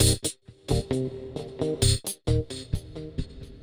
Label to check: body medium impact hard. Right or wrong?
Wrong